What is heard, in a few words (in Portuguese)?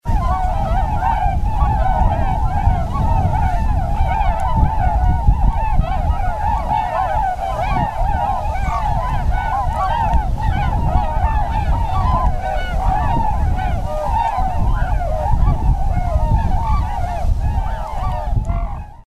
Canto do Cisne